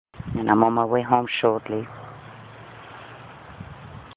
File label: EVP